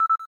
cam timer last

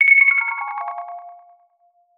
ringtones, HTC One M9

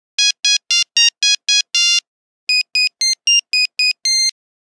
Playground, Nokia Buzzer; Windows Phone apps

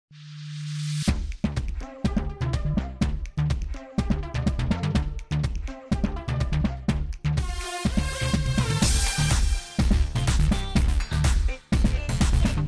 The Voyage EDM, ringtones; Samsung Galaxy S22 SM-S901U

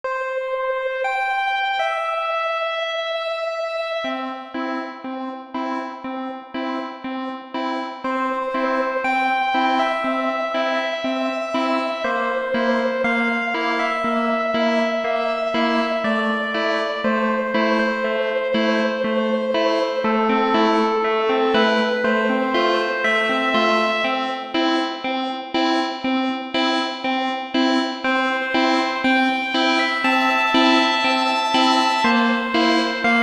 Rain drops alarm; Sony Ericsson; PlusFourSix; SE Sound Project; Paul Bergman

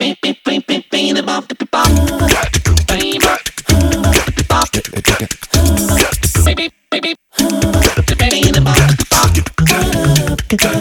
Acapella Beep, ringtones; LG Viper LS840

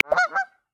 Canada Goose, Polar Region; Xiaomi Redmi-8A

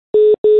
Callwait; Samsung SM-W750V ATIV SE

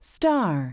Voice Star

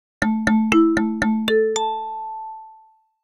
Jump Higher, phonetones notifications

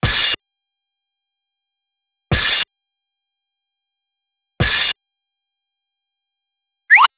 Skate waltz; Pantech PN-210

